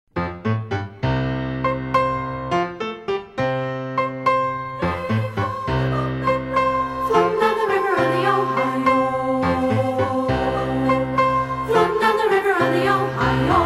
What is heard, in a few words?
alto, choir